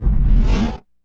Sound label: IMP FLAME, Cinematic Impact